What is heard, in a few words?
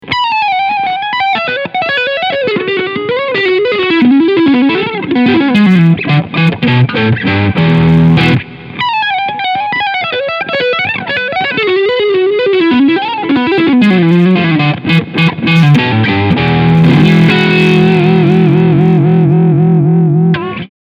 Overdrive Singlecoil